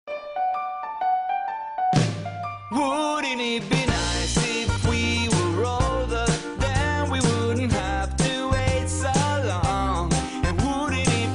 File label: volkswagen ringtone